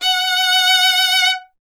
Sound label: STR VLN, Vln Detache; Miroslav Vitous